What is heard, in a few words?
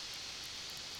Background Noise